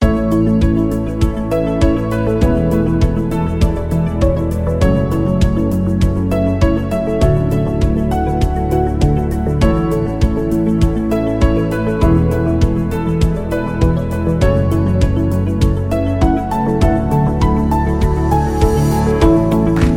Technology Ambient Advert; MIUI12

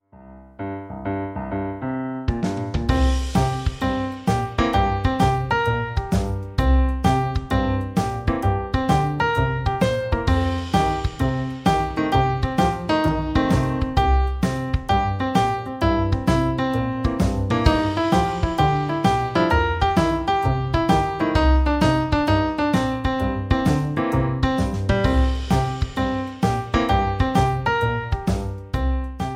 Relaxed atmosphere; RINGTONE; MIUI12

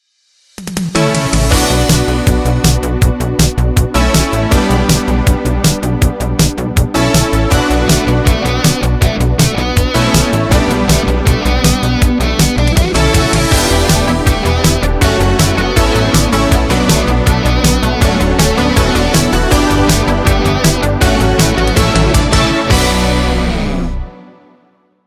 Cyber Synthwave; MIUI12

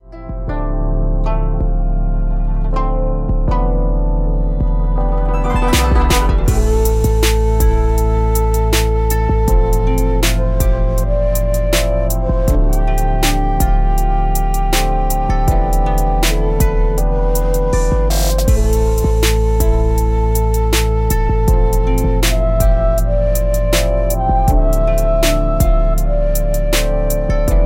Guofeng electronic rap Soundtrack, ringtone; MIUI12

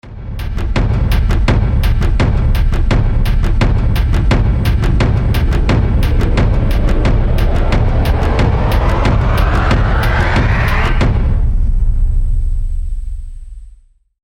Atmospheric drum flash; MIUI12

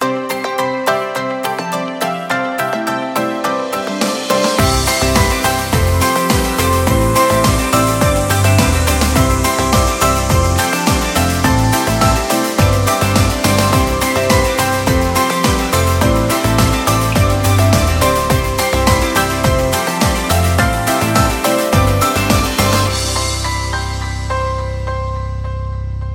Smooth Uplifting Summer; MIUI14; HyperOS 1

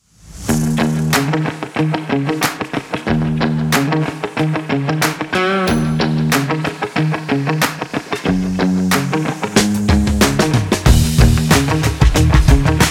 funky groove; MIUI14 and HyperOS 1.0

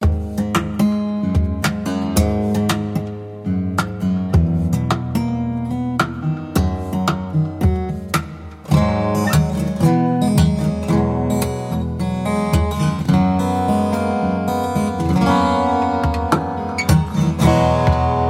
Sentimental Acoustic; MIUI12

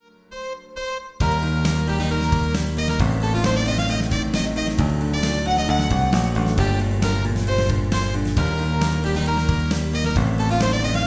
Swing, ringtone; MIUI12

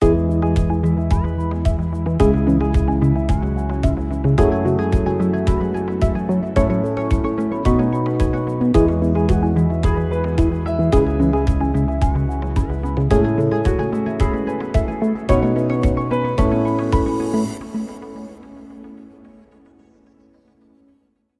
Inspired ambient; Inspired ambient 20s; MIUI14 and HyperOS 1.0

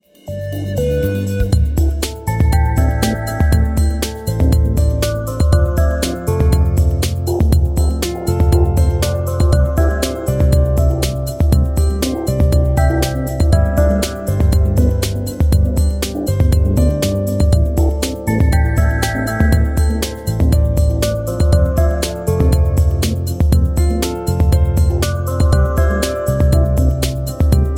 Futuristic Flow (Promotion BGM); MIUI12

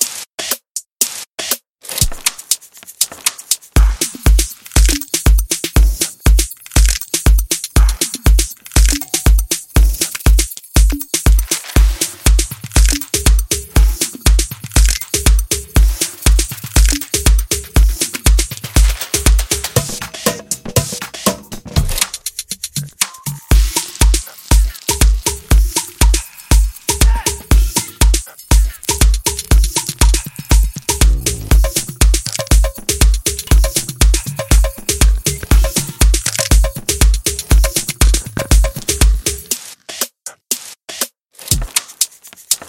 Kitchen Percussion; MIUI12

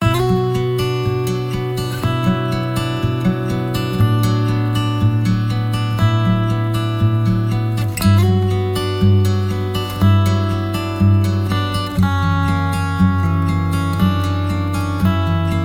Acoust Dramatic I; MIUI12

